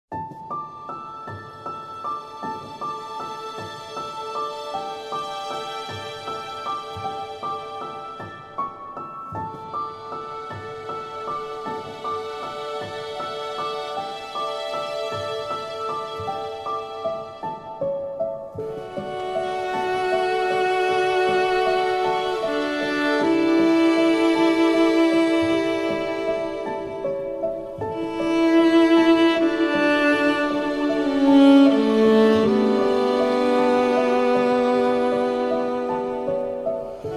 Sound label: Instrumental Sad Music